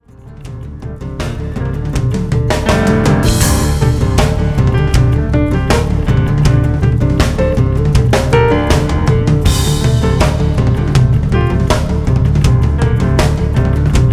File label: Acoustic Version